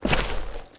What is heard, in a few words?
male grass, footstep